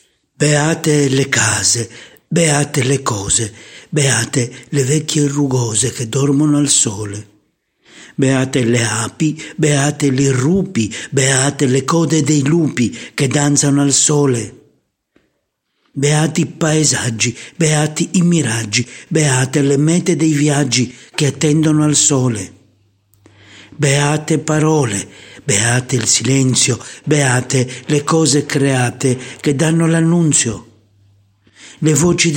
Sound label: beat; Adriano Salani Editore; Filastrocche dalla voce dell'autore